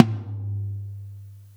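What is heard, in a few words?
TOM soft; Zero-G; Chemical Beats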